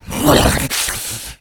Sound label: shoved, infected